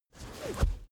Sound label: car armrest backseat close fast